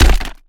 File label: head hit; finalmother; isaacs